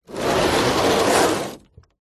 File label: industrial large heavy 10l tank plastic full water slide hard ground; zapsplat